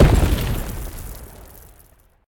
block explode; netent; pyramid

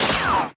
gunshot; netent; deadoralive

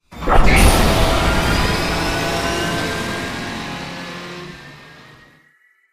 nitro Boost Effect; netent; multipliermayhem

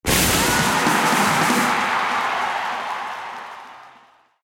bonus game Snare; netent

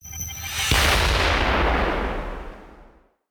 mega Super Win Attention; netent; wishmasteroct

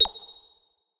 count Up End; narcos

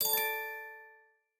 count Up End; netent; secretofthestones

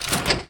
spin Start; netent; bloodsuckers

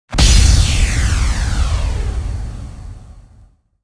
mega Win; netent; narcos

